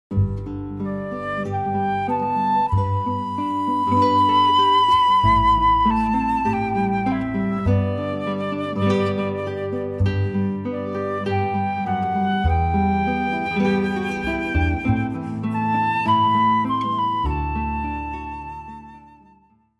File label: guitar flute bass trio